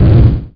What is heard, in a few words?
quick explosion; replicaisland